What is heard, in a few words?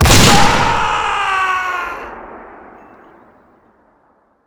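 infantry death; SuperPower 2 Redux V7A; unit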